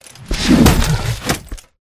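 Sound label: Rat buff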